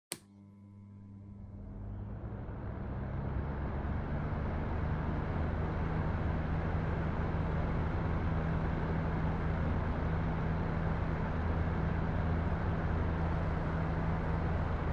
Air Circulator (Low)

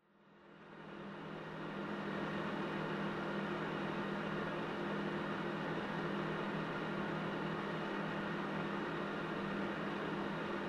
PC Operating Noise Far Long

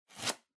Cardboard Box Label Peel Off; ダンボール02-06(ラベル 剥がす); 効果音＞梱包材

